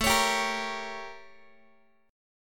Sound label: A 7th Flat 9th Guitar; A7b9 strummed; JGuitar